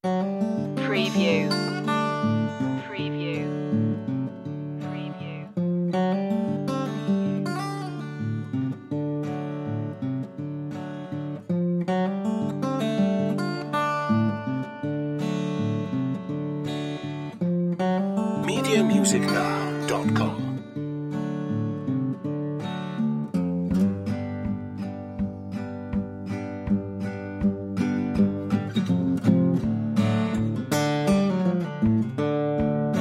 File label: soul broke blues loop